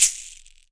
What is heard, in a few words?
SHAKER AFR, Drums; Big Fish Audio; Ross Garfield Drums VOL-2